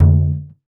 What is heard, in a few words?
Surdo Open, Percussion; Roland BK-5